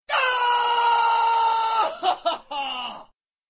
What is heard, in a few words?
Male Shout And Laugh